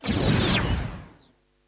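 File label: disruptor; technology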